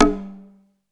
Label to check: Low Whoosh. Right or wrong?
Wrong